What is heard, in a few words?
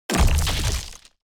successful build